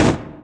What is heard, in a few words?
Dash Stop; Zelda slowing down from a dash